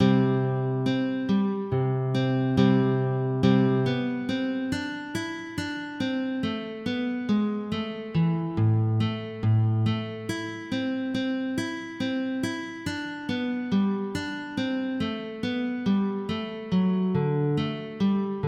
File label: Calata, MIDI